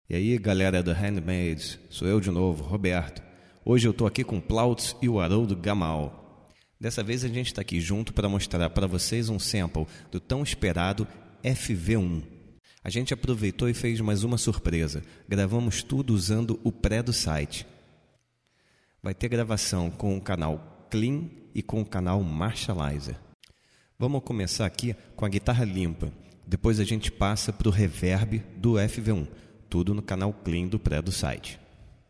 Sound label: FV-1 Reverb Chorus